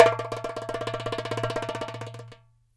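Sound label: DARABKA roll, Mediteranean Traditions percs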